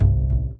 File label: Bass Missed note; Bayou; Music Riverboat